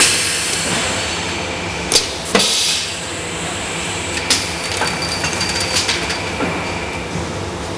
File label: Hydraulic